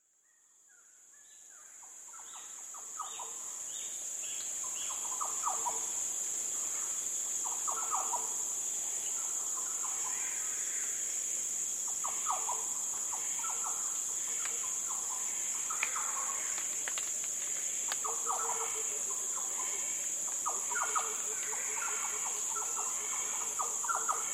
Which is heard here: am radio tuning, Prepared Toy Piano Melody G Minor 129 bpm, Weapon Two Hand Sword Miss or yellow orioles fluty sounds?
yellow orioles fluty sounds